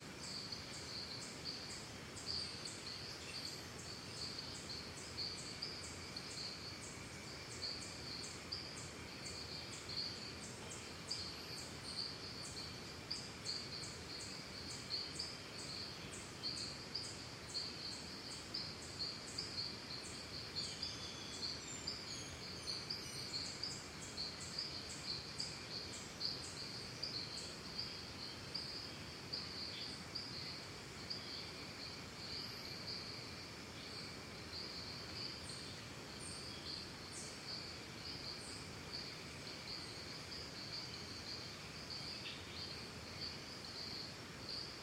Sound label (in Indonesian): suara alam